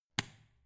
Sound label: switch turn off hard